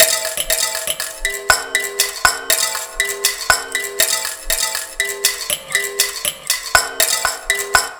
Finger Piano Loop, Ethnic Instruments; Sonic Foundry (Sony Creative Software); The Electronic Music Manuscript Collection

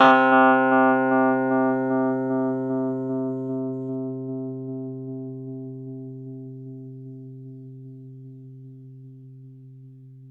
Rhodes 2C; 3D Sound; 3D Keys VOL-1

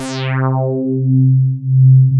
RESON FILTER, ROLAND MKS-80; Rubber Chicken Software Sample Bank

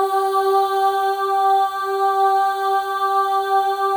AH, FEM; Spectrasonics; FEM.2 AHS

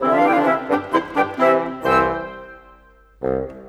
Cinematic, Orchestral Series; Sonic Foundry (Sony Creative Software); Orchestral Series VOL-3; Cinematic 20

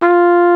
flugel F4; Greytsounds; Scott Peers Sound Engineering

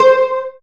synth, c, Top End Tinklers

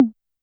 Tom Ana Clean, YAMAHA RM1X

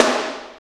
SNR PAIN SN; AMG; Now CD-ROM (Roland); Snares

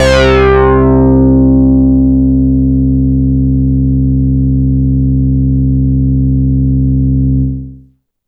MOOG SYN, Synths

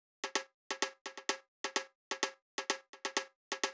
Drum Loop 128BPM Perc; VEC5 Drumloops; VEC5 Multi Loops 128BPM; Loop Elements

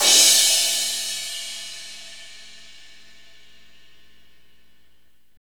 CYM, Fast Rock, Roland; Northstar

